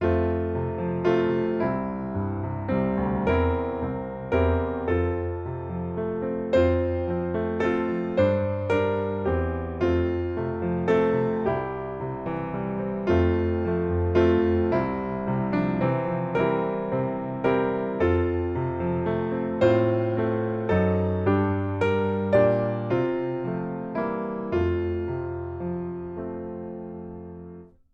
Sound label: piano